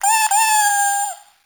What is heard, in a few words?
Cat Loot Crate Meow; CatLootCrateCommon